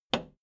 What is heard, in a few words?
video tape player plastic rewind push